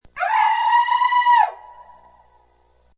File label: Female Scream, Single high-pitched woman's scream; Screaming; Voice